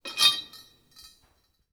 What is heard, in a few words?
Metal Pipe; 99Sounds; The Warehouse